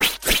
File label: swish swish; Bala